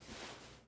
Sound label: ui pickup cloth; Rust